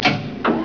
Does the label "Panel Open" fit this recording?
Yes